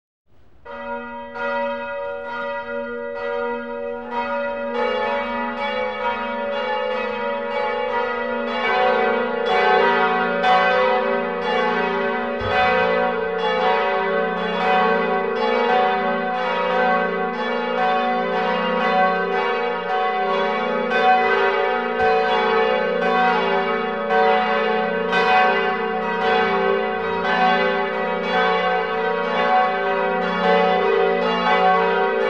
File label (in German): festliches leuten der kirchenglocken; geraeusche digital